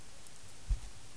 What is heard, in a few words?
electrophone